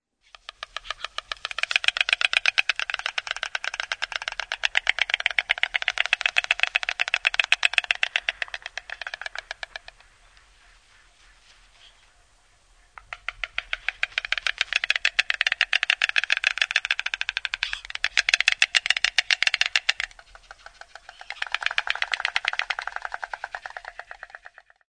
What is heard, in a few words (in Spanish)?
ciguena blanca